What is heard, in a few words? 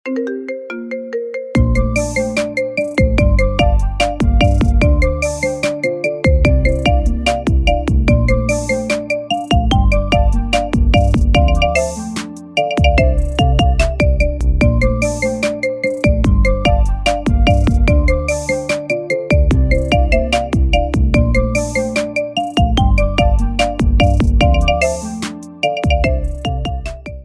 Marimba Remix